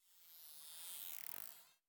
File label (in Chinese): 海豚水中待机声